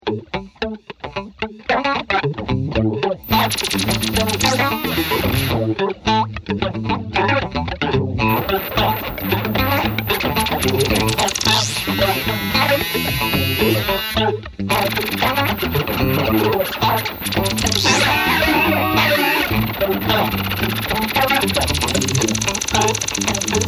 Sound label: wobble groove; Lyles